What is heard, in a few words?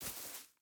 footstep boots grass walk; Rust